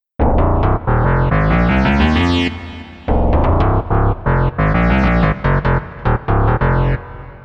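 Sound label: lead fm power; the transmitter soundset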